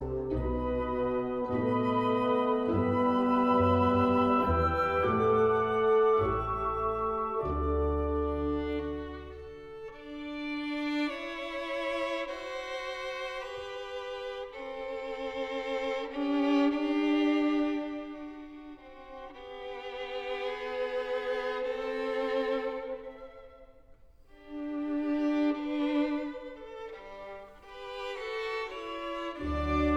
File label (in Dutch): Vioolromance; Beethoven; St Paul chamber orch